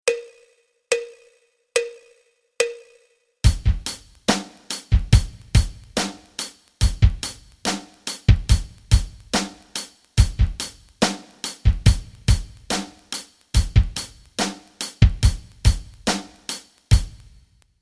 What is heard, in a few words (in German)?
rock rhythmen